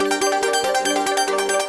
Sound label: collect; plentydragons